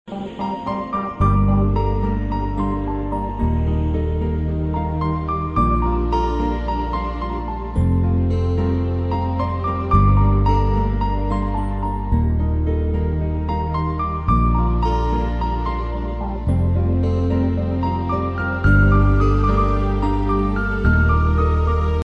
instrumental ringtone

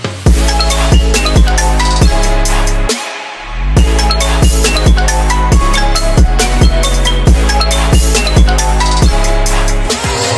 oppo reno ringtone

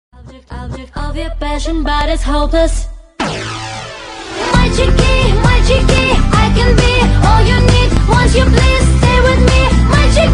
hiphop ringtone

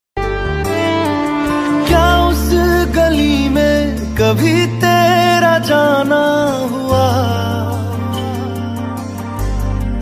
bollywood ringtone

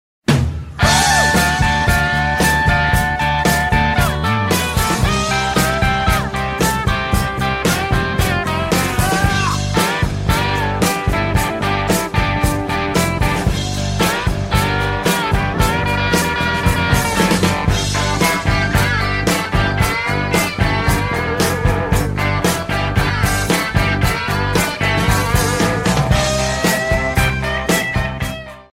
ringtone; apple

